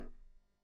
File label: build generic